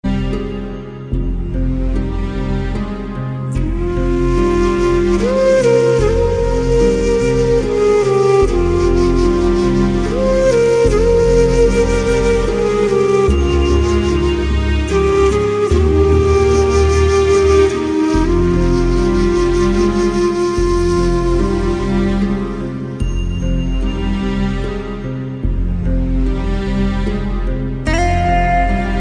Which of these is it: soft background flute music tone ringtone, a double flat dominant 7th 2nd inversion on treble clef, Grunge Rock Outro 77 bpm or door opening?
soft background flute music tone ringtone